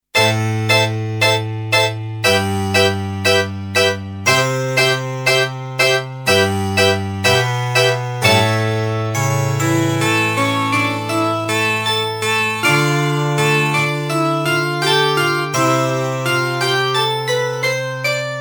m300 harpsichord

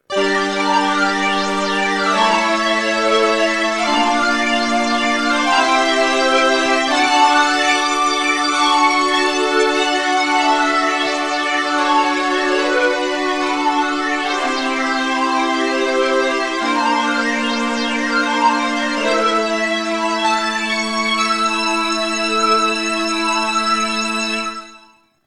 eminent 310 strings phased

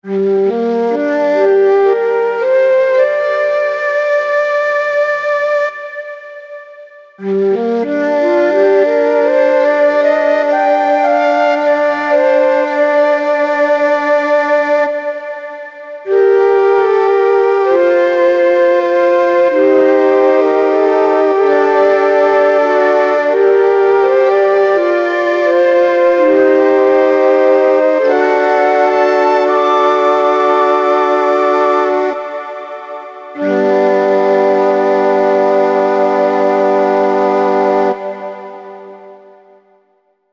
m300 flute

